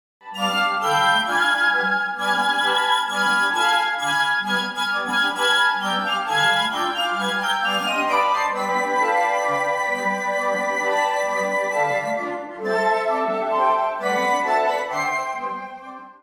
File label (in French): Bolero ensemble de flutes; Ravel